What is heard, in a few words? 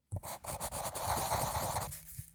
menu exit click; 『Mikan』